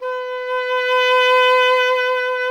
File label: E. Horn; Miroslav Vitous; Solo Instruments VOL-2; Solo lnst. II